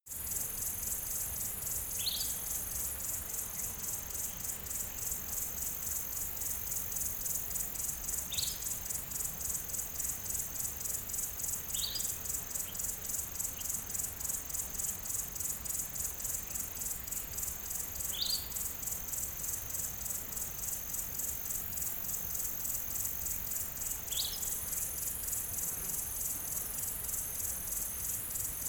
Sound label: savanna summer afternoon